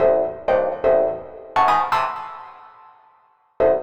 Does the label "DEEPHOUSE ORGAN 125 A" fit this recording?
Yes